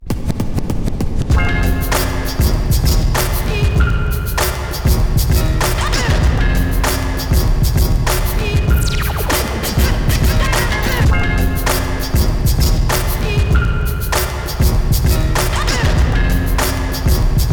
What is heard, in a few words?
Beat; NBA Live 2003; PlayStation 2